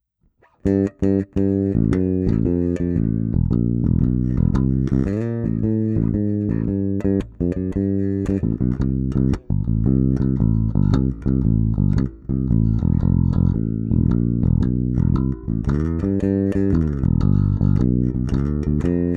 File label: bass